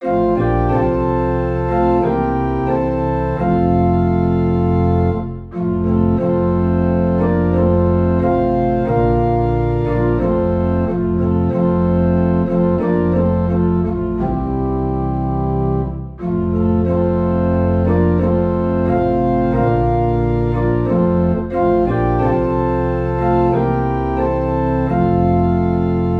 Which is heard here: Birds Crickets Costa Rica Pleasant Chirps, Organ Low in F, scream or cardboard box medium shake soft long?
Organ Low in F